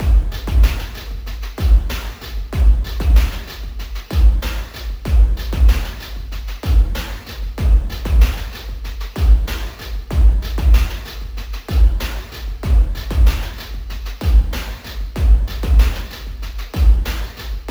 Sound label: synthy ambient drums